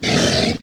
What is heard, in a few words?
Monster Mammoth type Death; Inotia 3